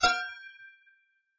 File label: Ting; Ki11er Clutter